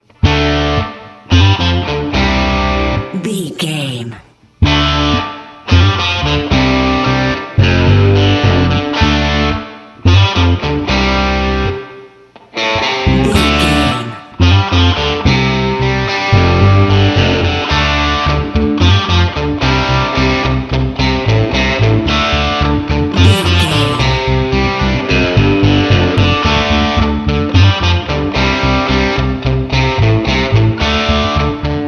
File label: highway rock no drums